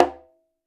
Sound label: Conga Hi Slap; One Shots; Drums; Ableton Live 12 Lite; Core Library